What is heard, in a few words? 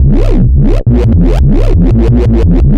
Convoy Bass, Drum n Bass